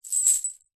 animals dog collar chain place into persons hand pass over